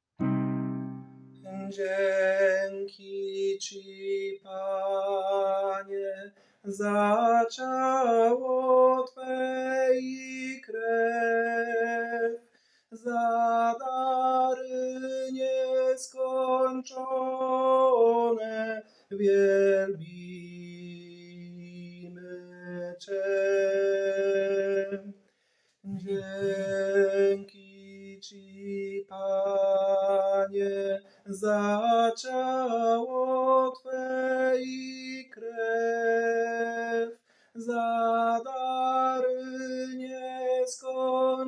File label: Tenor; nagrania glosow